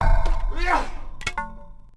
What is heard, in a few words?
Build Spearman; Civ4 warlords